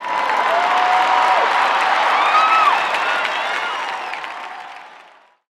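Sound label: Basketball Crowd Cheer; SFX Kit; Crowds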